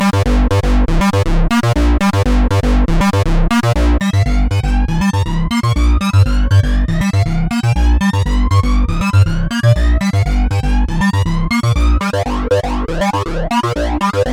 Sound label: eternal on synth bass